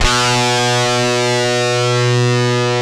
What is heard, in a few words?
Dirty Lead, DISTORTION; Sound & Vision; Gigapack I CD 2; GUI E-DISTORTION